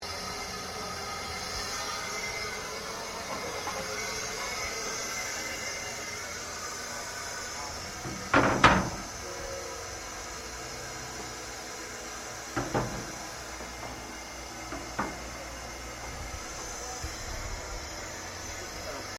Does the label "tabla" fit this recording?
No